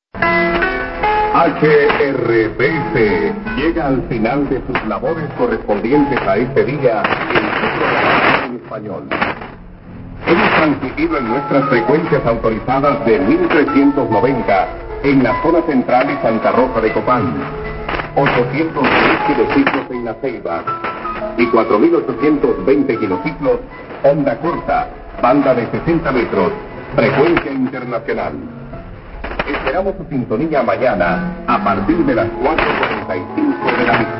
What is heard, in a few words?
interval signal; evangelica